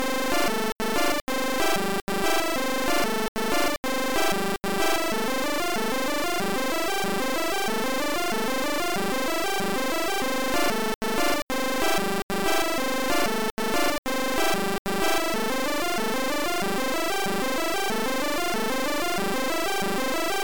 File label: circus, chiptune